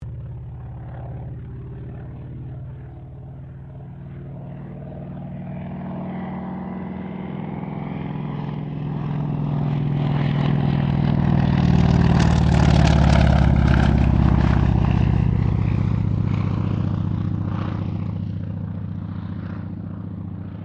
AIRCRAFT CA-28 CERES PASS; Airplanes; Vehicles; Aircraft Collection

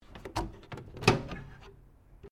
OVEN MICROWAVE WHIRLPOOL DOOR OPEN; Appliance Sound Effects; Common oven sounds; Appliances (Sound Effects:Household)

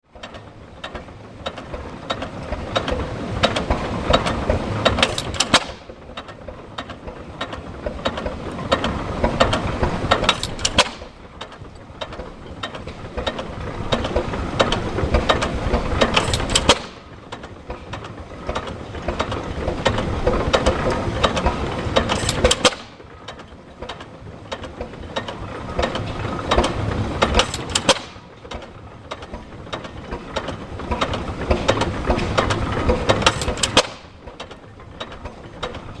Engine Single Cylinder; 1911 - 1940; Running With Nice Exhaust Splut; Motors; Tom Hutchings

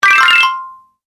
Award - Game Collect; Video Game Sound Effects

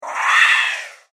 Cosmic Chute; Science Fiction Sound Effects

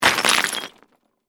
Crash, Rock, Debris, Small, Dump; Rocks; Materials; BlastWave

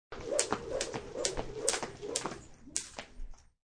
Jumping rope; Exercising; Human

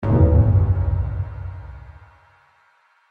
Hit Deep Phase

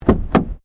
Car Ford Focus Door Front Open; Ford Focus hire car; Cars; Vehicles

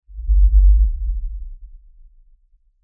LFE Ultra LFE Hit, Lfe Ultra Low Bass Hit Impact

